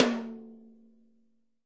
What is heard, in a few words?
thin tom, Multisampled Real Kit; REAL LIVE KITS